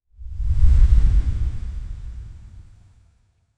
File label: thick hot smoke rolling, smoke